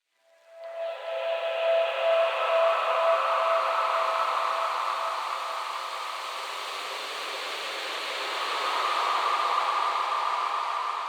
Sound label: Atmosphere - 140 BPM - E